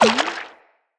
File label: Ricochet Mushroom Hit; Puzzles; BeanStalk